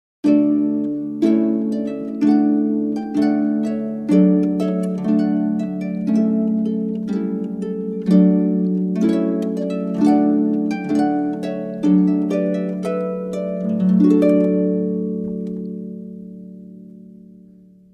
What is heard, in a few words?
trumpet voluntary, harp